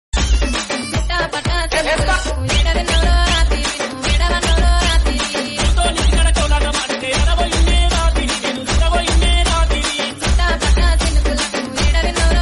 song cover song bgm telangana

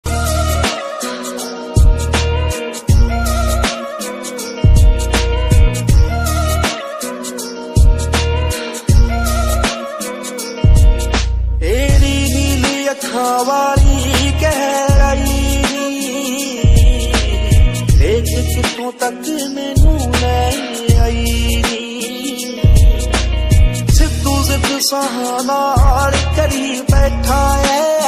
ringtone sad song

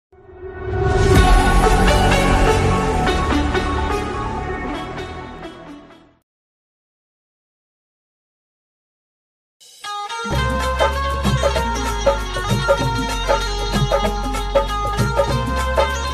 Marathi Diwali song banjo

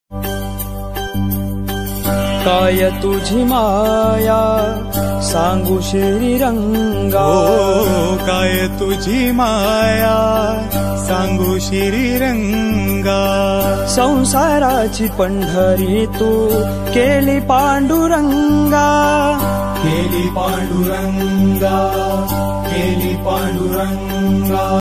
Marathi ringtone

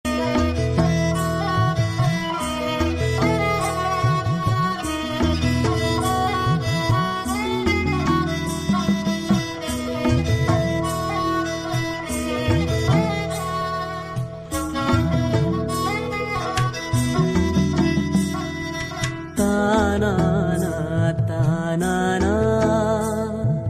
marathi song ringtone; tdm marathi movie

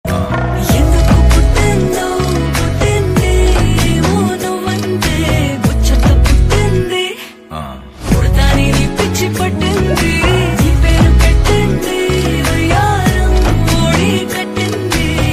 song ringtone music bgm sauth song; devara

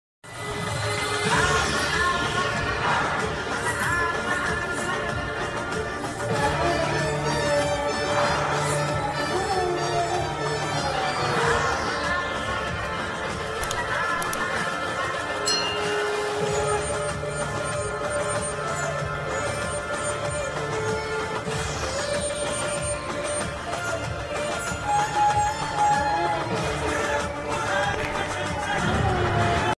movie interval bgm ringtone; Swag movie

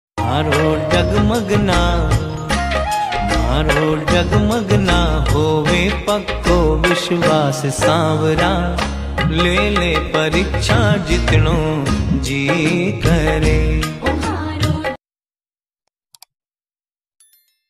ringtone song; sanvariya seth

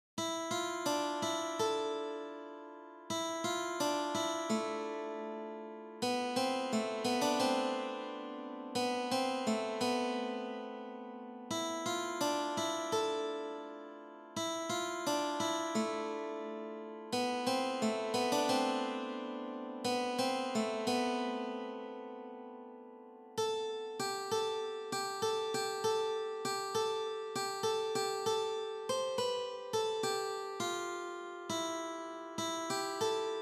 bgm

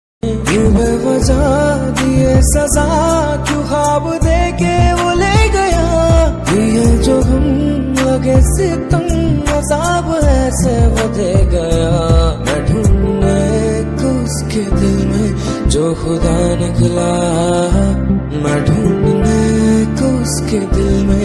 sad ringtone, hindi ringtone, caller tune

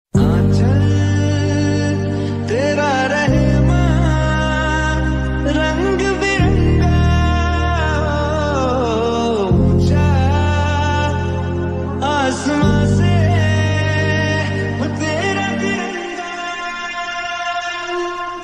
ringtone desh bhakti ringtone

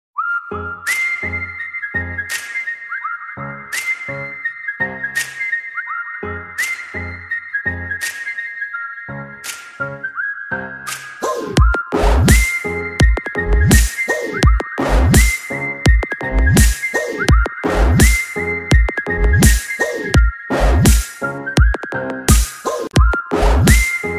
love bgm ringtone south ringtone

